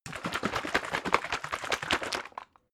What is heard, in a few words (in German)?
bottle syrup shake fast, gastronomie